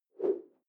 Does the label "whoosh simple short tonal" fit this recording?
Yes